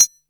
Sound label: Perc; FL Studio; young kico drumkit; Chronicles Of The Atlantic Trap (Sound Kit)